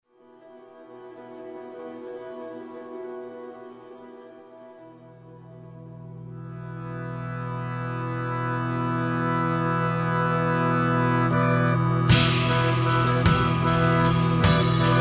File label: Circus